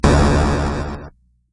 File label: drop